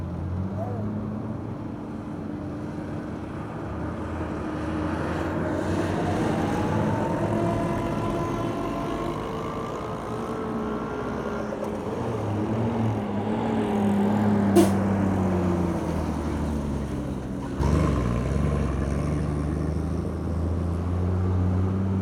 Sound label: Traffic, India, Busy, Horns; Poetry Project